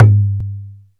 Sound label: baya ge, Ethnic Percussion